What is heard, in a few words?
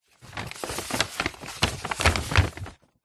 foley paper bag large hand place inside